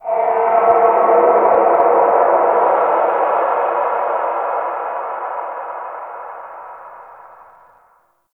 Landscape Ambient Texture

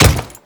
Default Step; Enemies; Footsteps; Warframe; Grineer Royal Guard

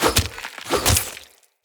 Hunter Bow Ranged Finisher Back; Weapons; Player; Bows; EnvoyHunterBowRangedMelee

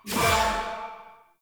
Choir Erupt Proj Throw

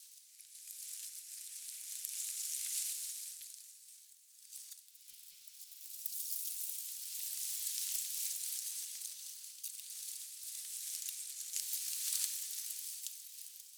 Fall Tree Leaves Loop; Warframe; Ambience; NineteenNinetyNine

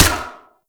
Fire; Warframe; Weapons; Single Viper; Wraith

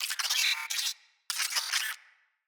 Space Drone Enemy Spotted; Enemies; Corpus

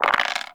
Dice Roll; Warframe; Koumei

